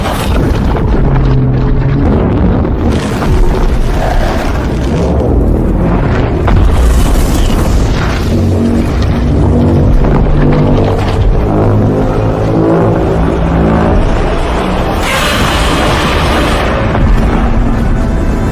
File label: thermonuclear roar